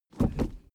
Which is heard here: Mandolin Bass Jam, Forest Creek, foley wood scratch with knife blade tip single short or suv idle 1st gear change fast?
suv idle 1st gear change fast